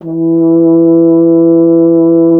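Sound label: BRS F.HRN, Solo Instruments, French Horn; Miroslav Vitous; Solo Instruments VOL-2; Solo lnst. II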